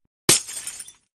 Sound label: Glass Break; Minecraft